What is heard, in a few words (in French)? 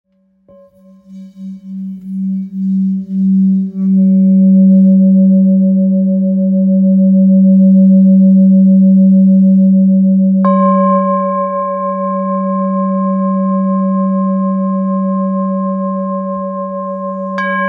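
Bol full moon sol 1.31kg, Bol chantant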